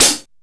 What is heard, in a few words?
CYBER KIT, DRUMS